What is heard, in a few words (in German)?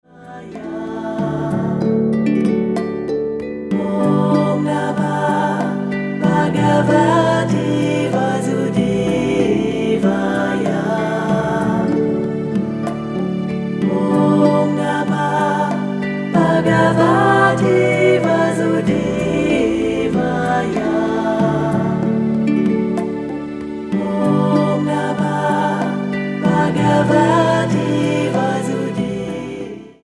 bhagavate harfe